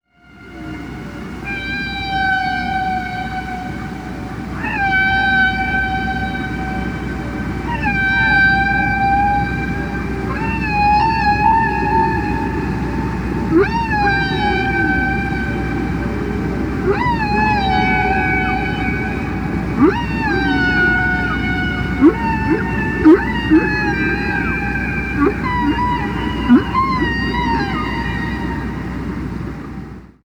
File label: Solo Whale